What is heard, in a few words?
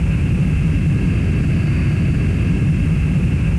hover